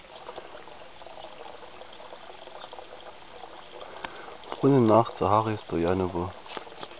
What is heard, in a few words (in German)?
Brunnen nach Zahari Stojanovo; O-Ton